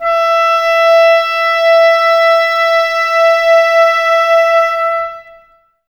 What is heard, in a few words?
Clarinets, Wood Winds